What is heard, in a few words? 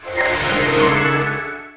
breaking limit; hyper novice